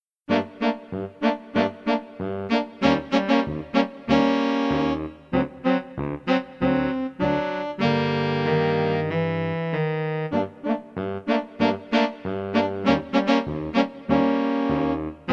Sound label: Ocean backing